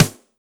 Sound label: BEAT SD